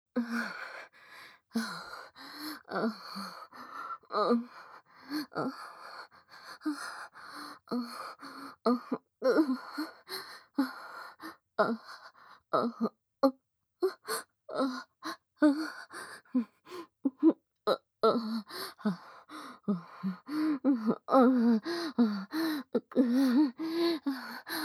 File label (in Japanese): 正常位ホールド・喘ぎ声・普通